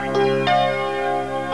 beeps

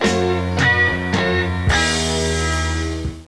beeps

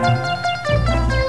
flaming, wizardly sounds, beeps

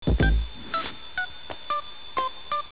pulse

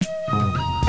coldmorn, beeps

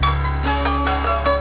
folks, beeps

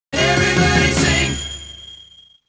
sing

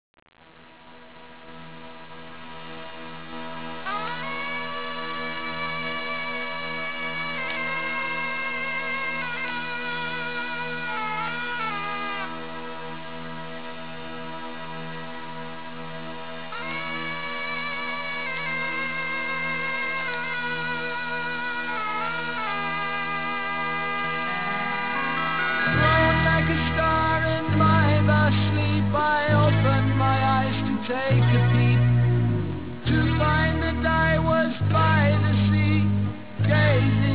hurdy

